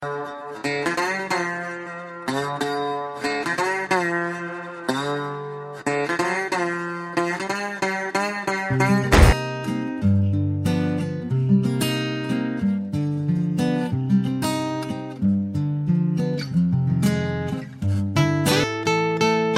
Telugu Guitar

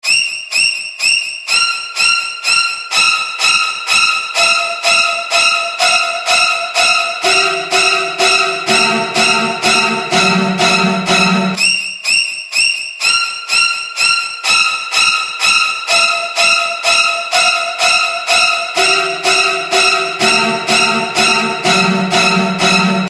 Psycho Halloween

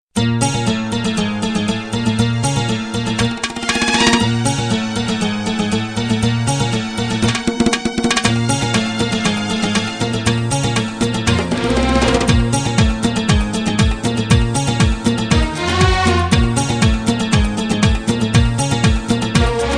3 Different Tone

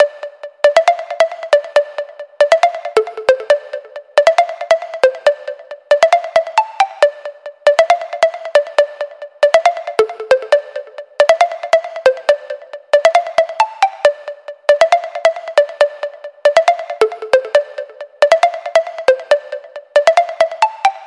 Popcorn Beat

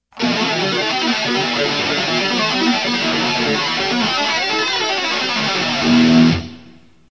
Electric Guitar

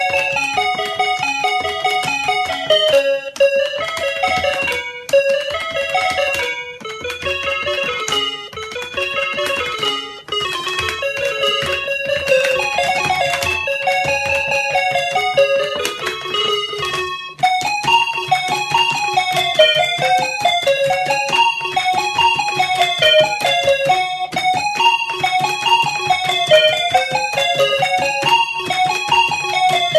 turkish march

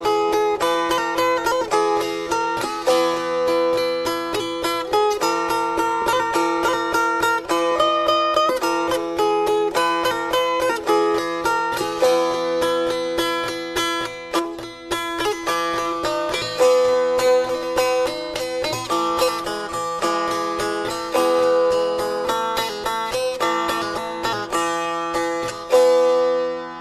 Sape

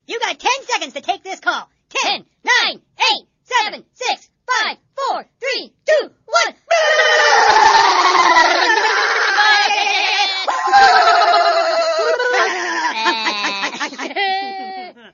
Take Call

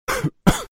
Cough

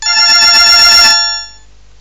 Ring

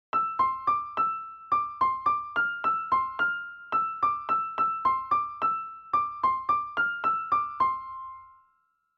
monotone; meloboom

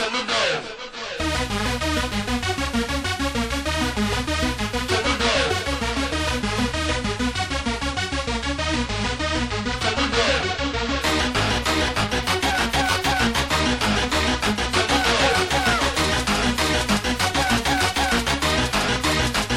Thunderscream

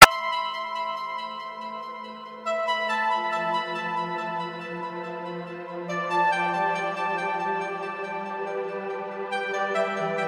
Chillout Mix

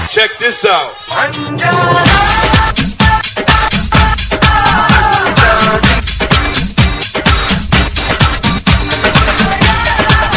Dhole Mix

